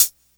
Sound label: crunchy hat A key, hi-hat